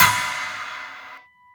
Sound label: normal hit whistle; osu!